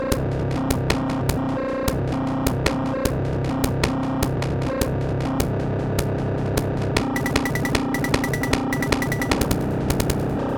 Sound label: keys vibrato glitches